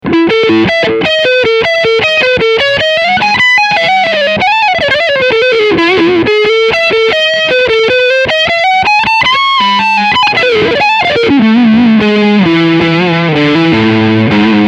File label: Fronthumbucker Overdrive